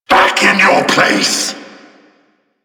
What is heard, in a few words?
attack vocal; Warframe; Duviri; Dax; Vocal Set B